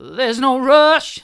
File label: theres no rush hard, vocal